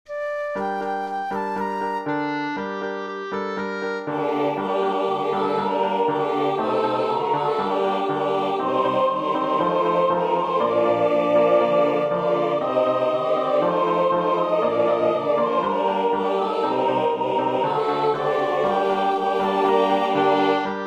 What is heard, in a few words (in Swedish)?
kör flöjt klarinett o piano; Noter för kör, piano, flöjt o klarinett; Gjord 1988. Text från Ords 15:23, 16:9.